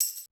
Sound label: Percussion Hit; Hip Hop; 090 Cmin; Hip Hop Exotica; Apple Loops; Big Fish Audio